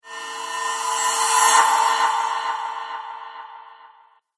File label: Reverse Cymbal Whoosh